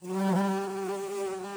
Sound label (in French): Bourdons beau massif sol; Vols Bourdn abeilles